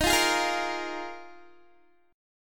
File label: D sharp Suspended 2nd Suspended 4th D sharp, Guitar; strummed; 22,20,20,22,22,x; 4F,4A,5C,5G,5C,6E; chord